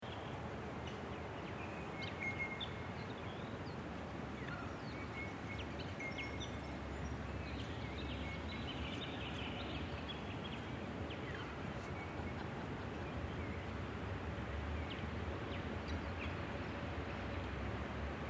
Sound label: Casurina Sands Recording